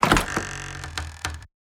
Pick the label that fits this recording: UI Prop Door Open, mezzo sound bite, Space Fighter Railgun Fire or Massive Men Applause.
UI Prop Door Open